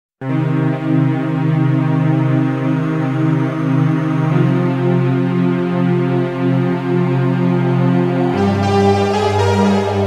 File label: Continue; golden axe the duel